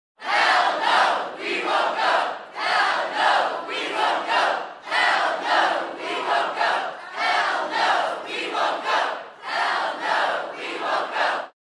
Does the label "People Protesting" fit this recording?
Yes